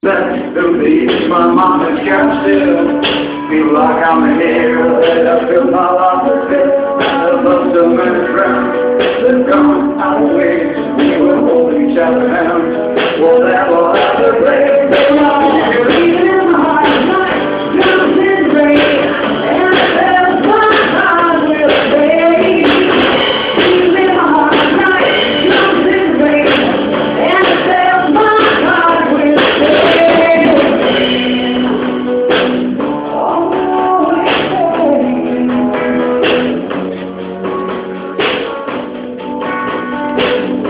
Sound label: Drums In The Rain